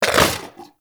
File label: Vocal Tranquillized Grunt; Warframe; Hunting Critters; Vulpaphyla